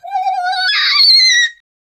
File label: little black cute creatur